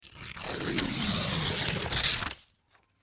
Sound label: Paper Tearing